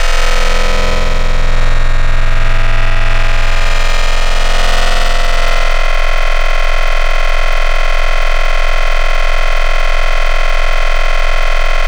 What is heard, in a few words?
FM Sub Grits, Wavetables; ESW FM